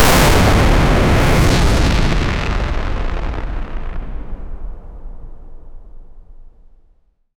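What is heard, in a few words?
futuristic sci fi energetic, black hole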